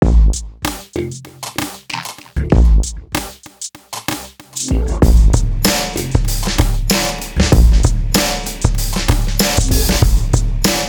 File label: Beat